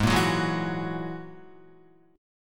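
A flat 7th Suspended 2nd Sharp 5th A flat Guitar, chord; Ab7sus2#5 strummed; 4,1,4,1,4,1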